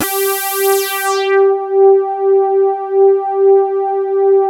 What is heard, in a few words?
reso pad; Sound Gallery - Charged